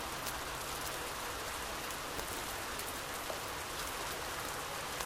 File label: amb Traffic Rain